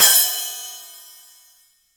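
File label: Cymbal, Real Drum Kit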